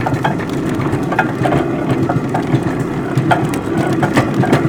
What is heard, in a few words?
Small Trolley Loop; Warframe